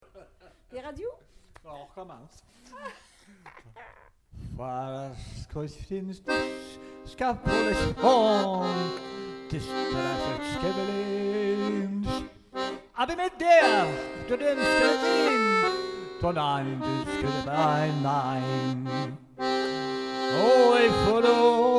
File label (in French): voix klez et accordeon